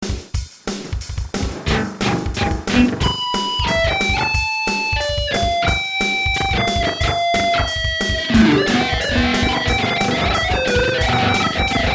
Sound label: delay; デジタルディレイの製作です。ディジタルながらも、アナログディレイの温かみを持った音です。